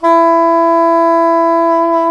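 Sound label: saxophone soprano saxophone; Roland - LA Composer vol. 1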